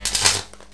welder; xatrix

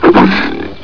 jump

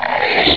gurp; openffa; raptor

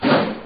jump; bigmack

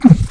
bump

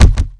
bounce, c4

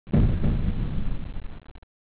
sphere

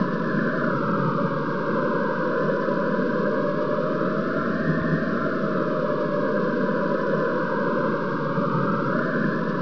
wind wail

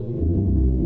drone

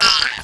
death; imp

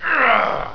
pain; ratamahatta

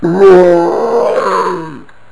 death; madpear

